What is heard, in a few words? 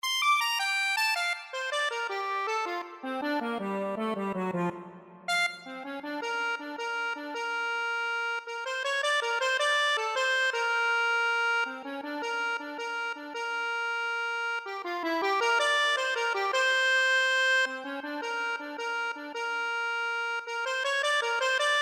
chords, accordion